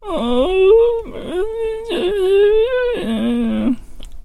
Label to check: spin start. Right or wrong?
Wrong